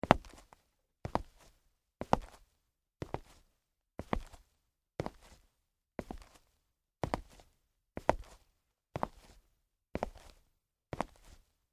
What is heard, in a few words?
Female Boots Walk, Footsteps, Hard, Wood, Surface; BlastWave